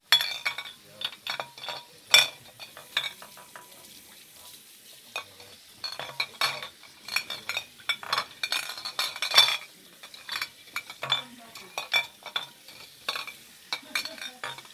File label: bottles